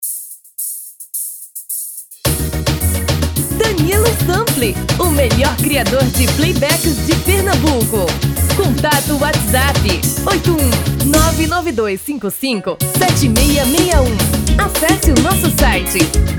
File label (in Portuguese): Axe